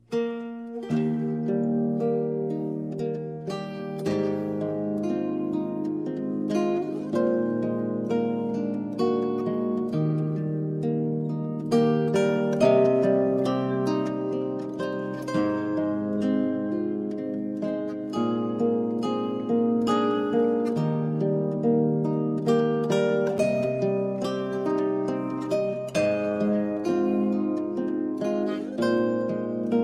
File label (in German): Romanze in D Dur, zither